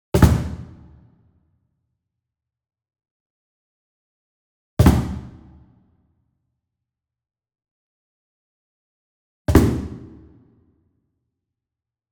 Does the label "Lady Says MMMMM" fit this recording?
No